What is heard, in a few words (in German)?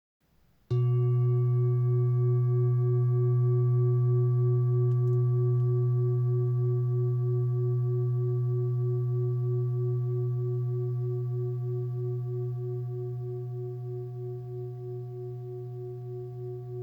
Kronenchakra Klangschale Mondklangschale 2485g 281cm 121Hz